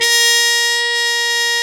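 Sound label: BRS RCA TP, trumpet RCA jazz; Roland L-CDX-03 Disk 2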